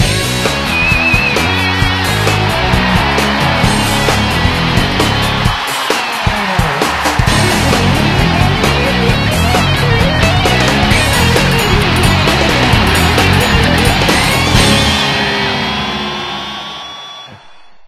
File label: Victory Theme; kurt warners arena football unleashed ps1 gamerip 2000